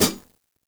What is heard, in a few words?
hihat - close; JazzFunkKit